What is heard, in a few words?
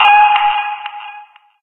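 test chamber negative, buttons; video games; source engine; portal2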